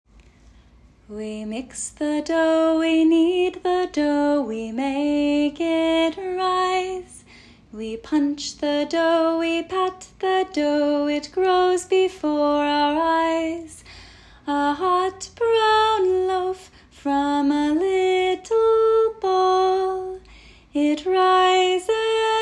Baking song

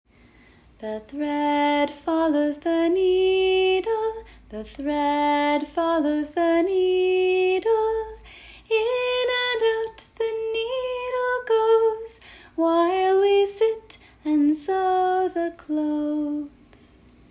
Sewing song; Daily and weekly songs/verses; bowertreepreschool

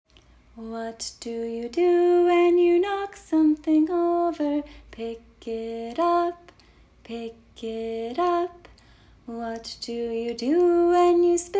Spilling song

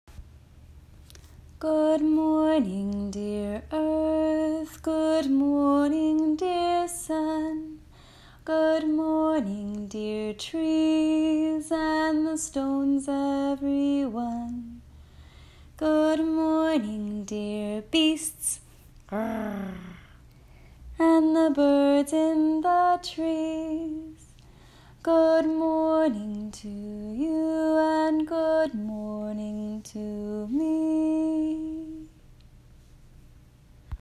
Morning Song

